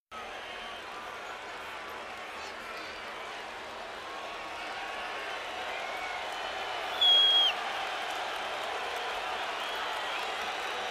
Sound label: Football Crowd Stea, sports arena football